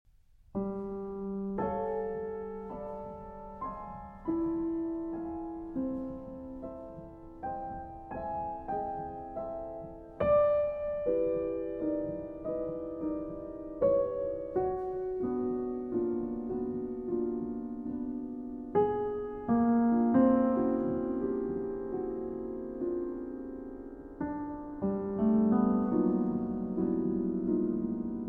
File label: Grand Piano